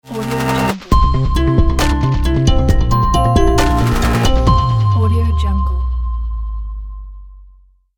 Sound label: market logo